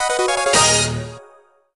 fanfare; anemoi

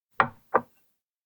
sherbet place table; anemoi

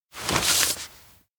envelope offer; anemoi

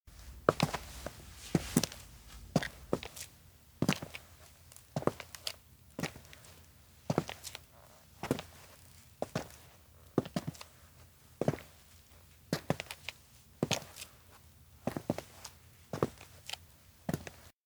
steps outdoor road walk; mugi awayuki; anemoi

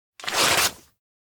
manuscript page tear; kurumi; anemoi パッケージ版

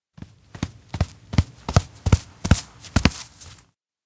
steps outdoor run; memory place; jet; anemoi

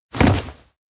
grab shoulder; anemoi

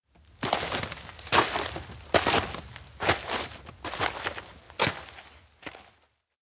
walk mountain rain; fumiya; anemoi

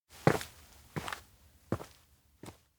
steps outdoor town walk carry; spica; anemoi

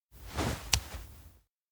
hold hand; anemoi パッケージ版 1.1

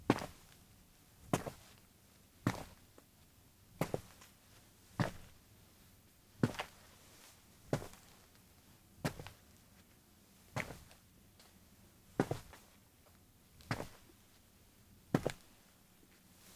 steps outdoor home way wobble go; anemoi

